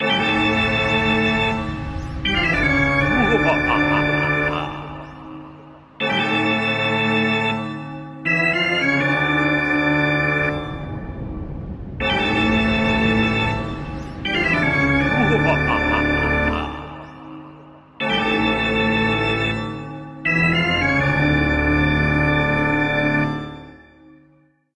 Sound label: spooky organ; Google; Google Sounds; Seasonal Celebrations